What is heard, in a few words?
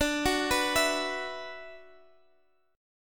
F sharp 7th Suspended 4th Sharp 5th, D